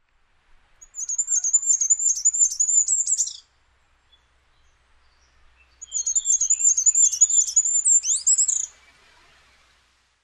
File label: goldcrest